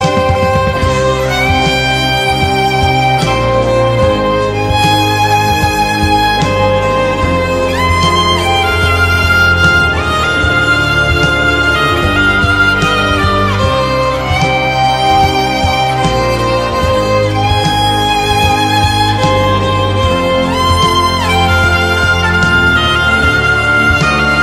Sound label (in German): Violine